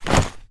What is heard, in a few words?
kicking shoes off